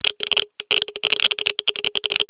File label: Geiger